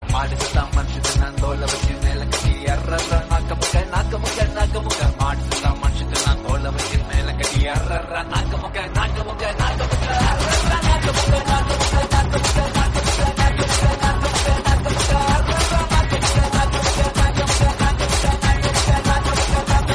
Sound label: hot tamil remix